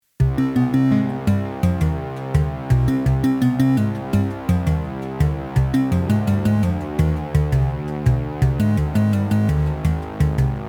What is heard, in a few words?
80s mood